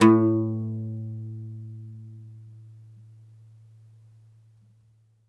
OUD A1 -R; E-MU Producer Series Vol. 7 – Old World Instruments

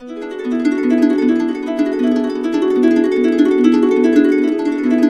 BISBI, Complete Harps; USB Soundscan vol.62; AKAI

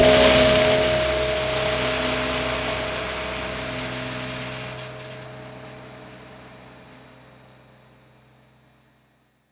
Prepared Drums

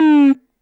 Drop Lo Speaker, Stylophone Beatbox

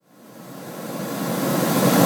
Reverse Cym, Cymbals

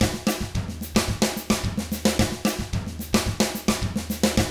110 SAMBA, Drums; ILIO; Double Platinum Drums 1

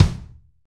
Rock Kick; Roland BK-5

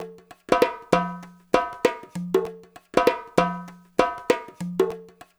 percussion loop

